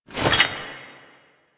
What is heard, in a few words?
Generic Foley; Warframe; Warframes; Hoplite